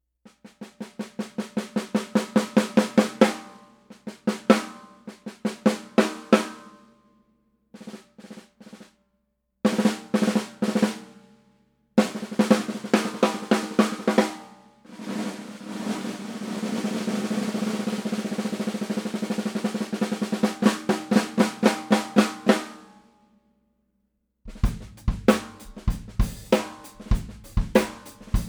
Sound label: Keplinger Steel